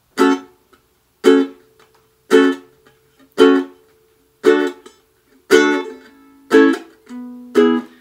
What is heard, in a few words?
reggae, ukulele